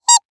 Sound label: Cartoon Toy Squeak; AdobeCC